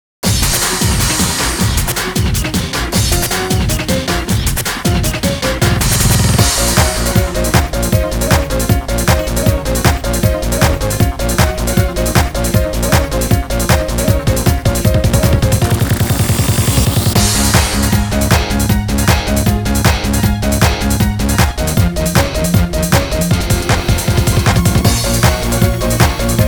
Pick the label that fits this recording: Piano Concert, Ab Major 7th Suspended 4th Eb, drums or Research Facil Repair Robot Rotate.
Piano Concert